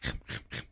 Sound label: eat